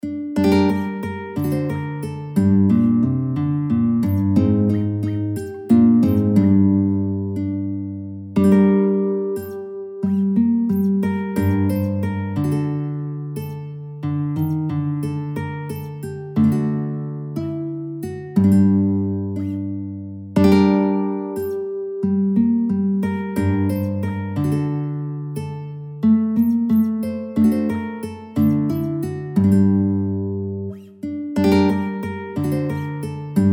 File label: BANDURKA; Zaplutalys hmary v smerekah